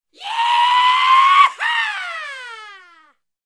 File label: yeehaww